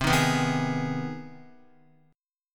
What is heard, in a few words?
C Minor Major 7th Sharp 5th C Guitar 4F,4A,5C,5G,5C,6E; CmM7#5 strummed; JGuitar